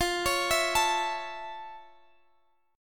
F Augmented Major 7th Guitar Half Step Down, chord